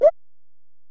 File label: Ac Perc, Drum Machines Alesis DM-PRO